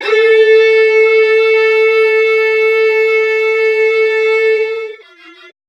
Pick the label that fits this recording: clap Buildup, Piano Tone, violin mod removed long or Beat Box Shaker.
violin mod removed long